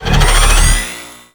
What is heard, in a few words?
Mech Sword Heavy Charge; Nechro